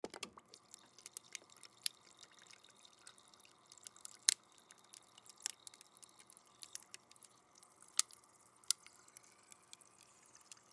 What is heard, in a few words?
Refrigerator, Refrigerator Pour Water Into Glass Cup Drain Gurgle Ice Crackle; Refrigerator Water Filter; Appliance; Household; Blastwave